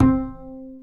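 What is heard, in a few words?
acoustic bass soft C 4; Masterbits CLIMAX 7 - Bass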